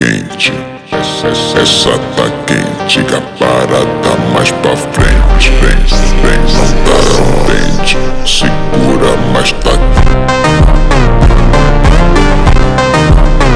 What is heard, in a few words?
FUNK Slowed Ringtone